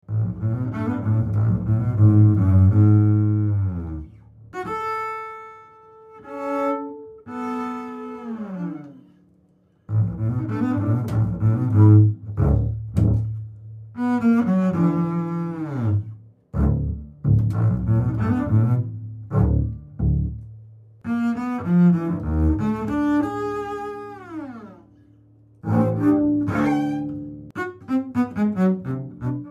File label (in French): Contrebasse comtemporaine; louis-antoine lassonde